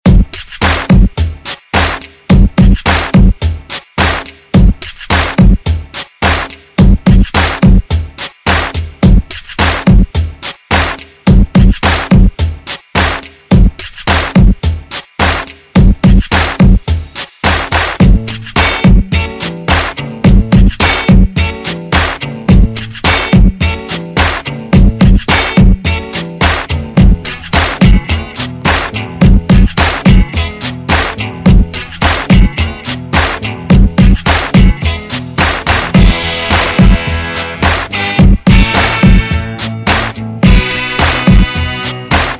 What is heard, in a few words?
60s Ext Redrum